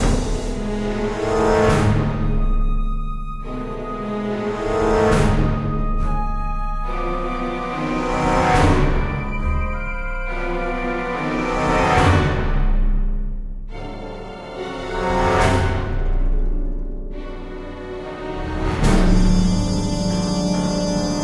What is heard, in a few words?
Suspense; Back to the Future: The Game; Episode 1